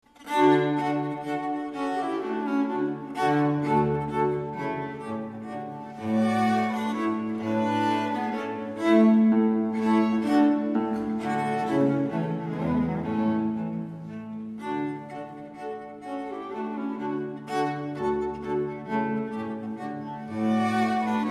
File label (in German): Barytondivertimento Menuet